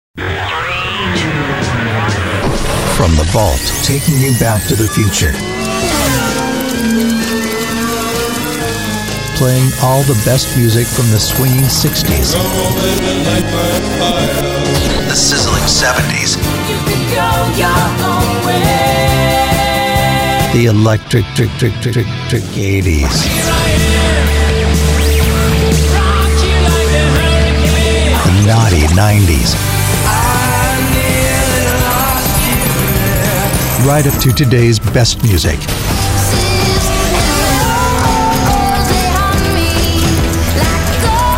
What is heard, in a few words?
Music